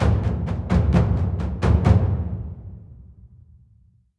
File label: ambience war drums